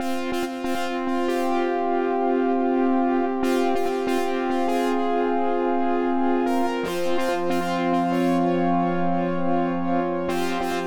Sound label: Space Brass; Bust n Rush